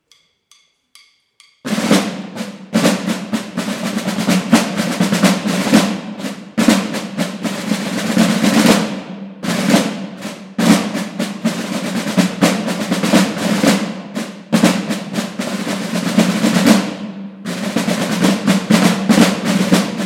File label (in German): Tambouren Silver Drum